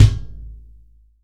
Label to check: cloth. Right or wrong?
Wrong